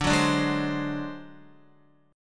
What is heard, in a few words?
D 7th Flat 5th D, Guitar; D7b5 strummed; JGuitar